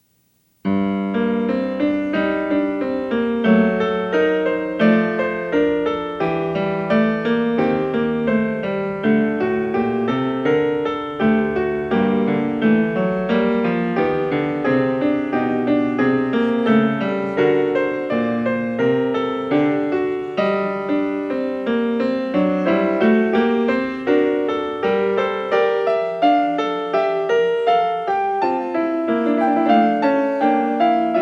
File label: Variation 21 Canone alla Settima, PIANISTS; BACH; GOLDBERG VARIATIONS